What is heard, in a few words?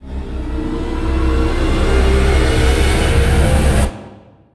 near win; arcane